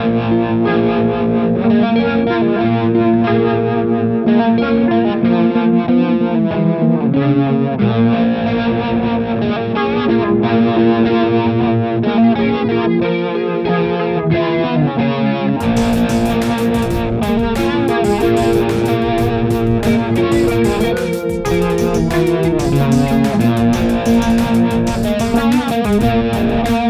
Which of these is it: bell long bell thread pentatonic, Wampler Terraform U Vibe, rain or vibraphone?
Wampler Terraform U Vibe